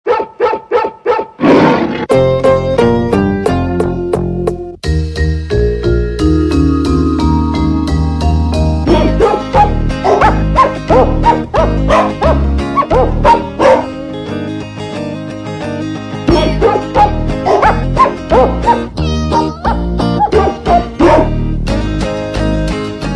Nhạc chuông Jingle Bells chó mèo hát

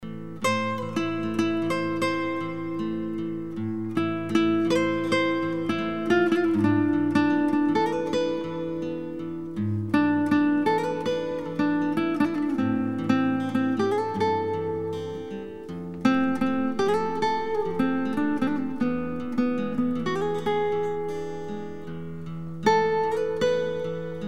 Nhac chuong nhe nhang

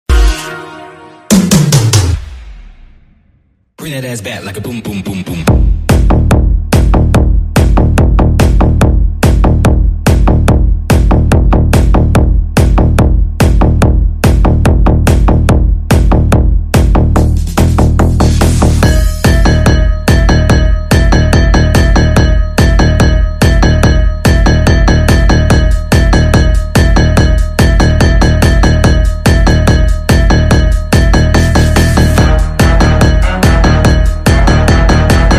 Nhac chuong Vinahouse hay

